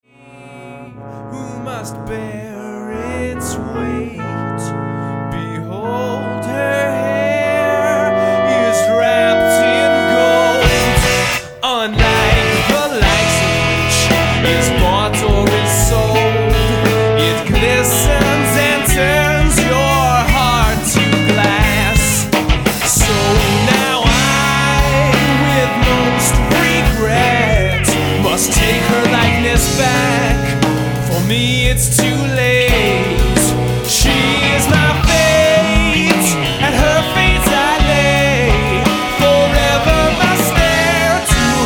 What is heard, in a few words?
Blues